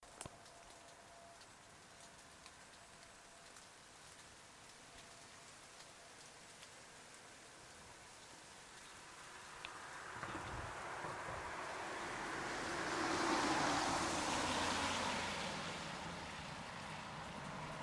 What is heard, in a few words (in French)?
Une voiture passe